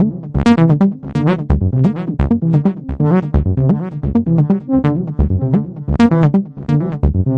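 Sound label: acidecho, 130 bpm; melodic loops; synth and electronic loops; elab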